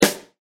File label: SNARE dry